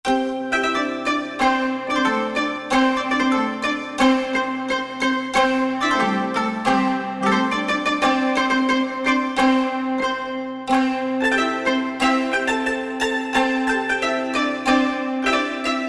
Chinese Orch; World; Roland SR-JV80-05

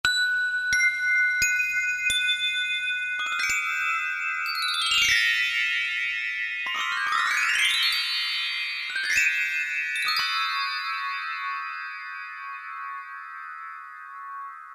Bell Tree; Roland PN-D50-02

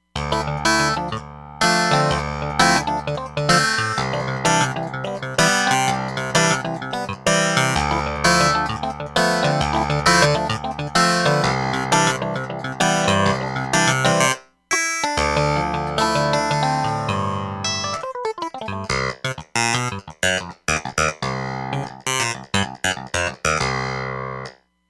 D6 Clavi

